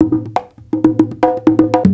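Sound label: CONGA LOOP, Techno Trance; E-MU Formula 4000 Series Vol. 2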